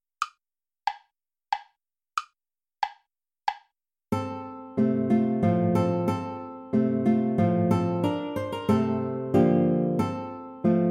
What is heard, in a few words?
waltz play along without guitar